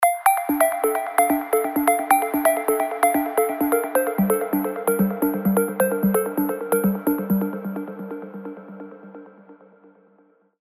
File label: Sea Breeze, trance plucks; alonso spire trance plucks vol 3